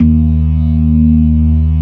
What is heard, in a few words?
TARK, organ combos